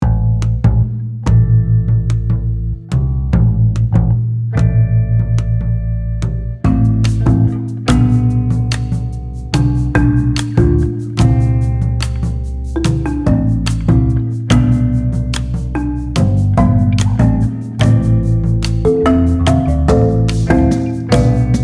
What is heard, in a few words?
colombia series marimba; tribe instruments